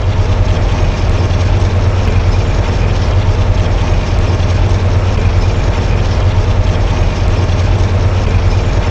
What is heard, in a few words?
cruise loop, v8; vehicles